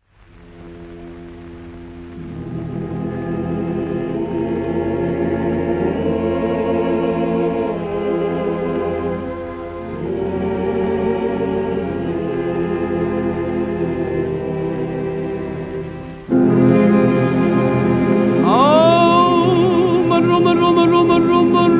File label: Hebrew Chant